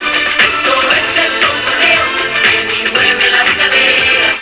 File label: disco